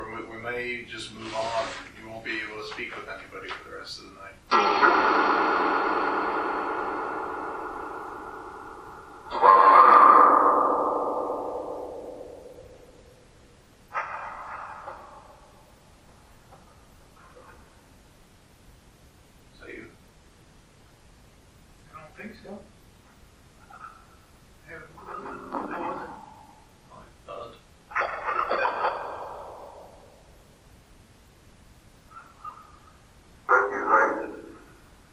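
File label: 1st Floor Doll Room Phasma Box; fairfield county infirmary investigation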